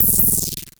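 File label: Klone Dual Percussion Synthesiser noise; KLONE FX NW8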